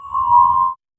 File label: horror themed correct ans, chime